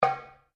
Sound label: Drum Darabuka Edge Slap, Egyptian Darabuka Drum; Musical; Drums; SoundEffects Foundation2